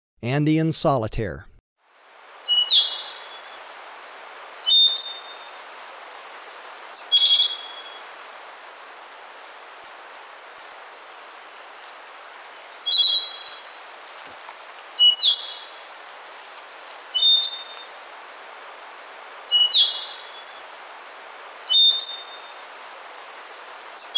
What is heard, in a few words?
Andean Solitaire